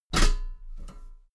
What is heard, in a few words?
step metal ladder step top heavy; schritte